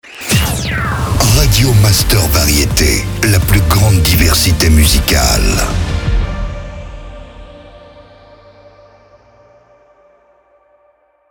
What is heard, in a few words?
Jingle SLOW; Radio Master VARIETE